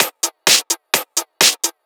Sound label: Straight Loop 128 BPM, House